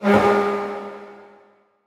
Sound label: Chair Scuff